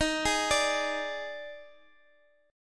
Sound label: D sharp Major 7th Suspended 4th, Guitar, Drop D; x,x,x,8,9,10; chordshape